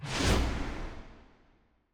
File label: ambience room shadow